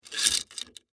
Door Lock Slide, Foley, Door, Lock, Slide, Chain, Lock; Unlock; BlastWave